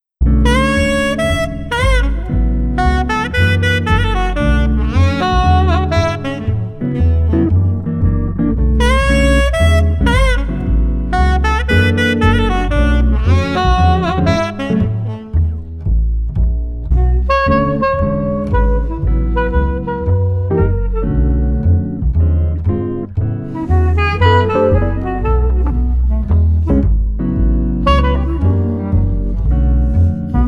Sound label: Street Mellow Jazz Ensemble 115